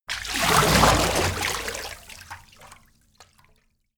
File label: splash long; haus garten